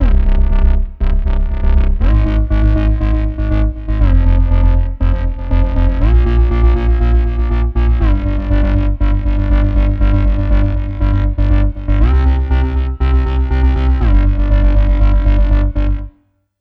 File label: Orb Synth